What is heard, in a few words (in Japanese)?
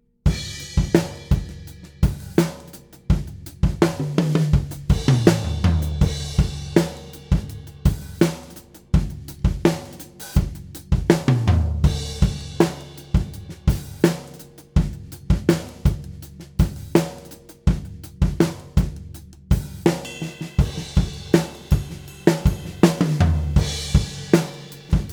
吸音前 ドラム サウンド; rockwool diy